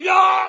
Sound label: death sound; jango fett; lego star wars